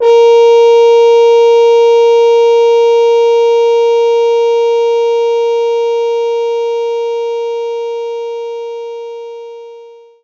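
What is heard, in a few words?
French Horn A#3; knights try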